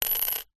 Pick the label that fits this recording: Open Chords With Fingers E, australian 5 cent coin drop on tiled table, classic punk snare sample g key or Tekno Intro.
australian 5 cent coin drop on tiled table